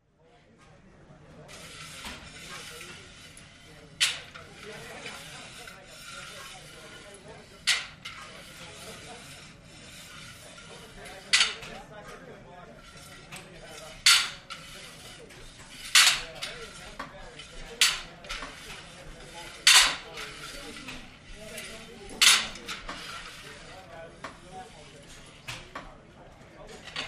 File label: Gym; Weight Room Ambience With Machine Movement And Male Walla.